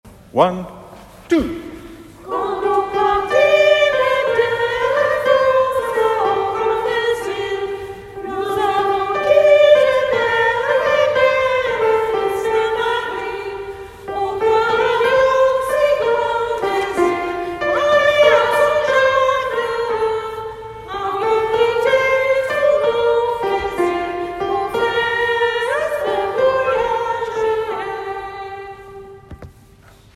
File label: Canto ultriea sops; citysings